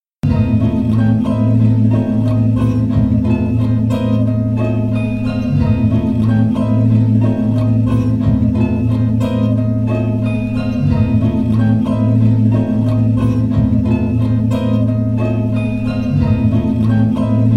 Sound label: loop